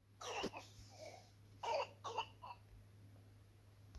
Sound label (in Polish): Szczekajacy kaszel